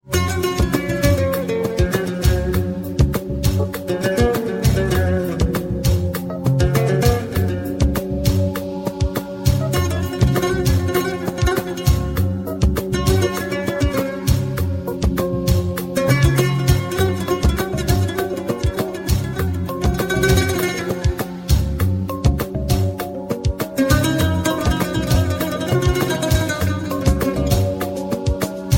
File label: arabic music ringtone